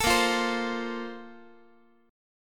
A sharp Major 7th Suspended 2nd, Guitar; A#M7sus2; strummed; JGuitar

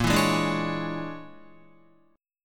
Bb 7th Sharp 9th Flat 5th Bb, Guitar; Bb7#9b5; strummed; JGuitar

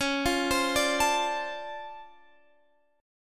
D-Minor 7th C sharp, chord

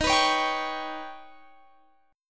D sharp Augmented 7th D sharp, Guitar

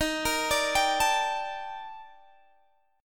Eb Major Sharp 11th, Guitar Standard; arpeggiated; chord

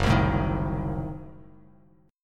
F sharp Diminished 7th F sharp Bass Standard; strummed; JGuitar

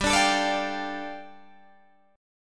G 7th Suspended 2nd G, Guitar Open D; down

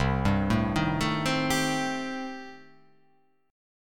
C Minor 6th C

